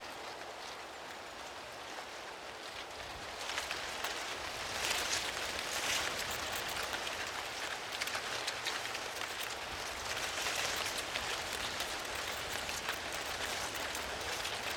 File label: WIND Corn Fields Day; InMotionAudio; Sound of the Corn